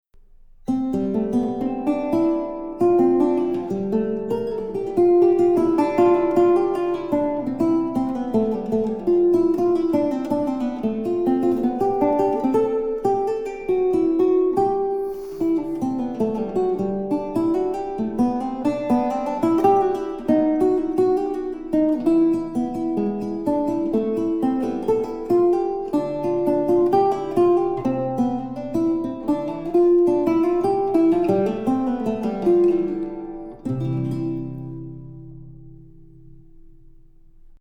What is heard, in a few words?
Guitar Sonata In C Preludio; Roncalli; Guitar Sonata 8